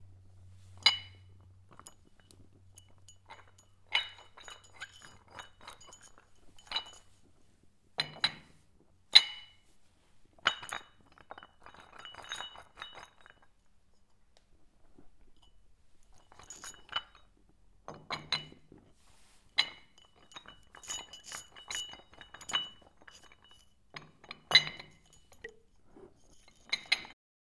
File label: Weights Picking Up moving